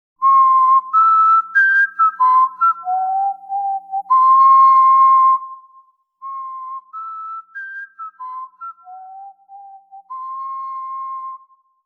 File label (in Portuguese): Assobio Escoteiro